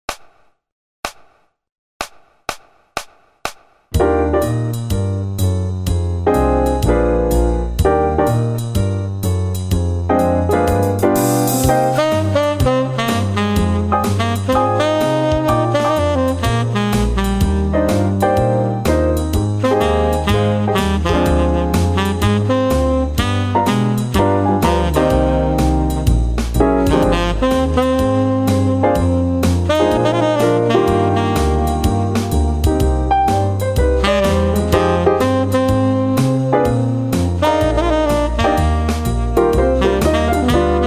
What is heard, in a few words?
Jazz; MyVirtualBand